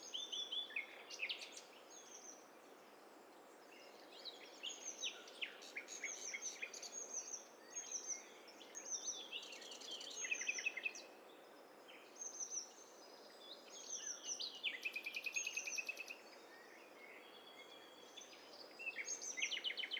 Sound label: STEREO BIRD COCKTAIL PARTY